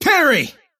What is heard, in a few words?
parry success; dota; kez